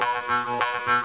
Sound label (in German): maultrommel